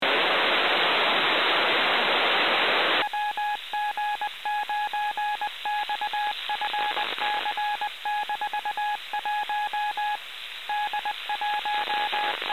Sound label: ArduSat 437000kHz AF